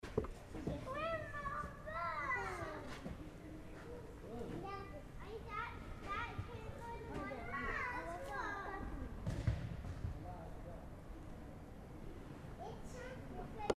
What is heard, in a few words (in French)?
Air de jeux